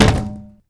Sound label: Hit Glass Tank Thud; SFX Kit; Foley - Misc